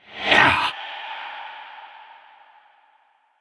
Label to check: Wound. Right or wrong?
Right